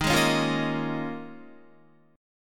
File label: Eb 7th Suspended 2nd Suspended 4th down Guitar; Eb7sus2sus4 strummed; JGuitar